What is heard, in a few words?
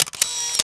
Camera